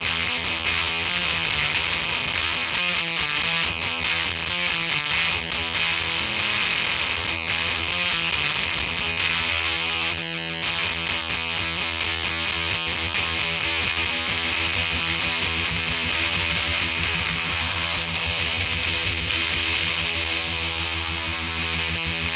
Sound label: disto lfo